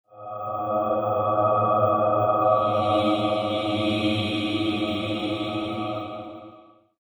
harddisc

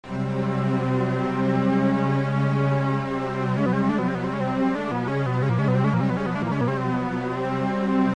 midi pitchbend

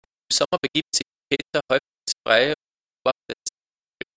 gate

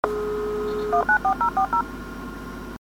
Modem

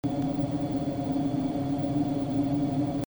noise U

